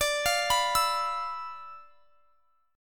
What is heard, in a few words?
D Minor 6th Add 9th; Dm6add9 arpeggiated; JGuitar